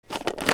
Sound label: HOUSE